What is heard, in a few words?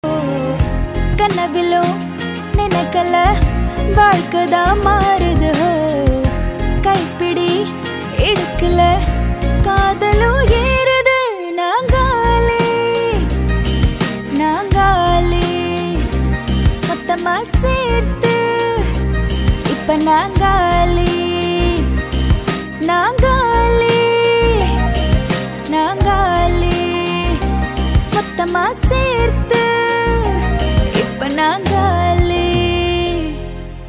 female tamil; good night